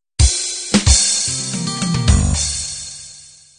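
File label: pop 16 beat intro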